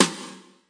BRUSH SLAP, Roland TD 7; DRUM MACHINES

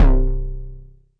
mbrute syn, Arturia MiniBrute

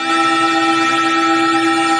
Synthesizer Middle C Notes, Lead

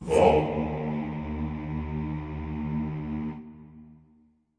deep choir note

